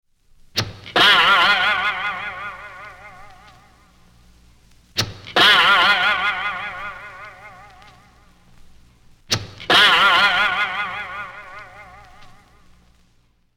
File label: Bo-ing Effect